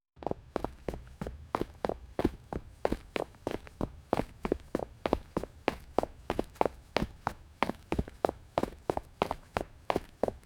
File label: the sound of sneakers run, footsteps